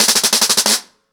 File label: Snare 90BPM, ACOUSTIC DRUMS; Sonokinetic - The Roots Drumloops